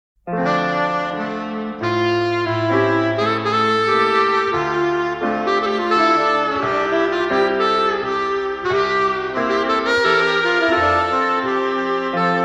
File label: Piano, Duet Saxophone